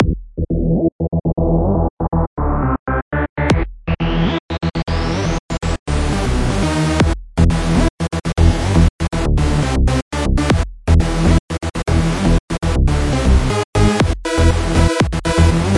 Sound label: Energetic Deep Tones